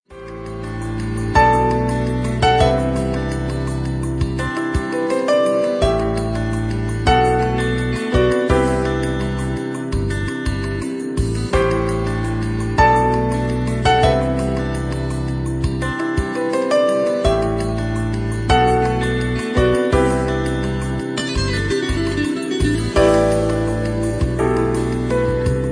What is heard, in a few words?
romantic